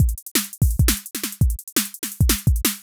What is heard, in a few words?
jungle drum break 170bpm 2 bars